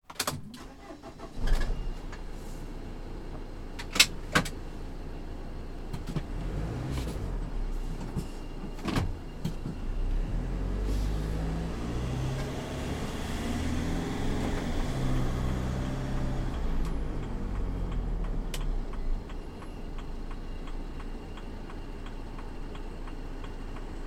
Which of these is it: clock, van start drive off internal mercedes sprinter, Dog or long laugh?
van start drive off internal mercedes sprinter